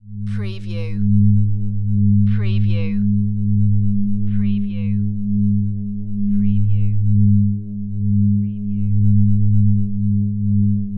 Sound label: Magnetic Field Drone